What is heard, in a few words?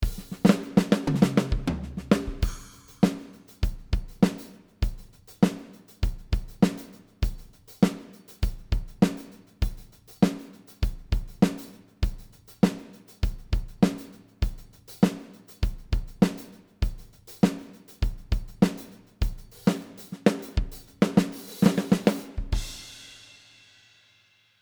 Yamaha Default Kit; Superior Drummer 3